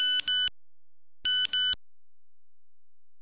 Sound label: E-Mail